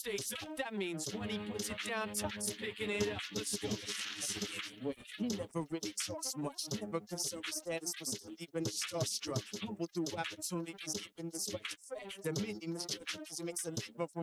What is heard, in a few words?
vocals; SiSEC11